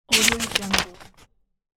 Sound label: page turn